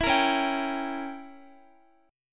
C sharp Diminished 7th, Guitar; C#dim7; strummed

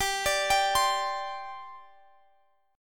C Suspended 2nd, guitar chord

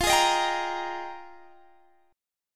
F Minor 11th, guitar chord

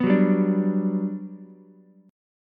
G Major 7th, Ukulele; strummed; JGuitar

